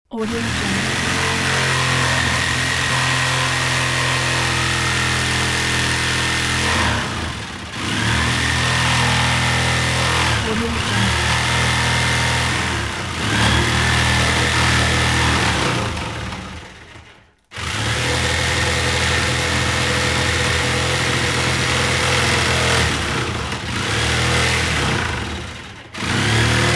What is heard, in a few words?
fretsaw cutting wooden plate